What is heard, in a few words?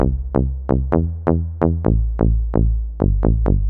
Bryggeri Bass